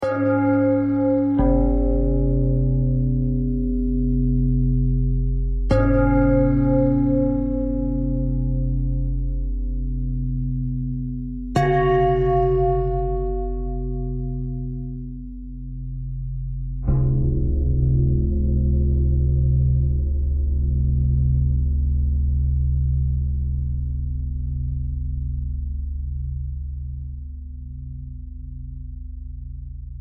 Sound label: bell bell thread simple, bells